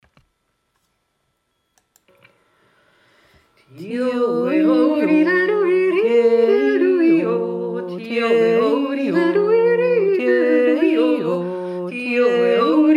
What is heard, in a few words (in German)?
3 stimme